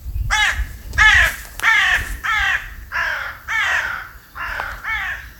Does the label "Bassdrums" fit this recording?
No